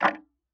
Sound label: Tick, Clocks Kitchen Clock